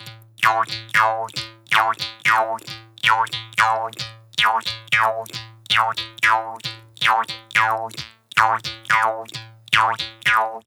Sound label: Gogona Loop BPM 90; Gully Loops; waves of bihu vol 1